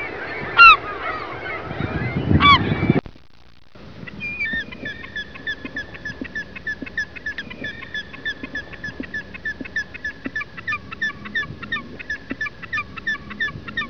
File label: herring gull